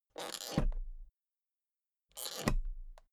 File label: suitcase close latch lock fast hard